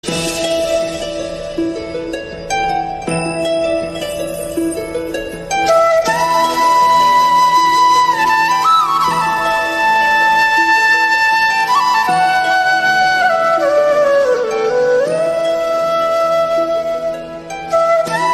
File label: krishna flute, ringtone